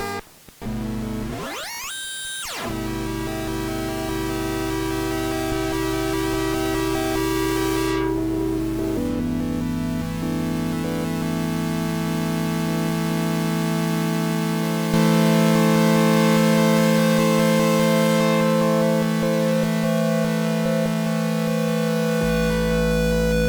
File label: C pulse